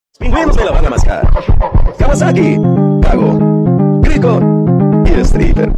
kawasaki slow

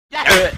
slap

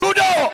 duduk

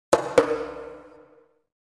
drum

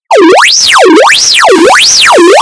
wee woo wee woo

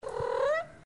miau

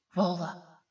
spooky call

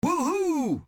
woohoo

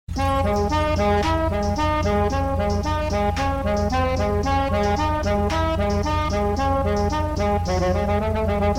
horn; gta liberty city

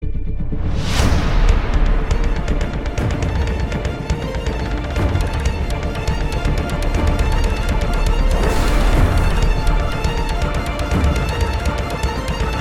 theme; the flash cw

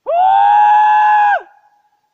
wooooooaaaahhhhhh

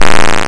fart

